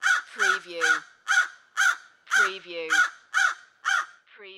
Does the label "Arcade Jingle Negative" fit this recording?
No